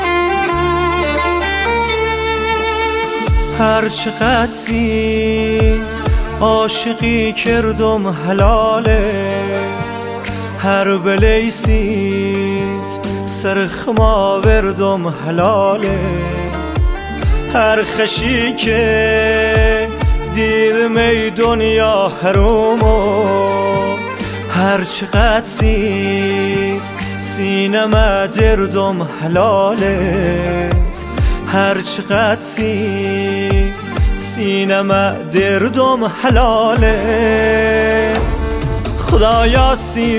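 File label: Lori romantic music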